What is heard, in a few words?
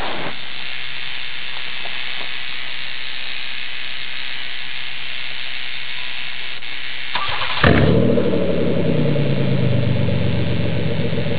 Muffler New Rev